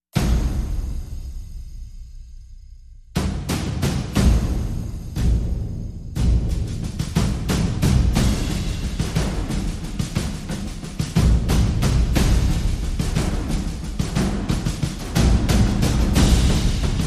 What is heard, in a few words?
Percussion Only